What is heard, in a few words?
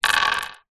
industrial metal ball bearings multiple down on wood